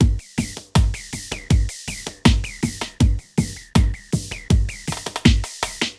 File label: drum loop, ambient, 160; eJay